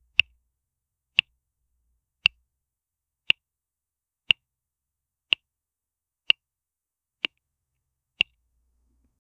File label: short button, button